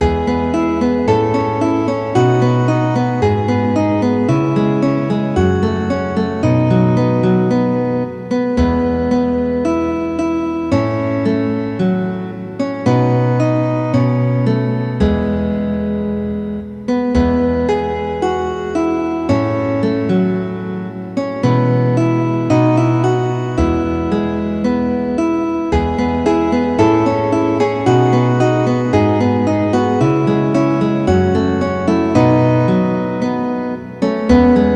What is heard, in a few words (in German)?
gitarre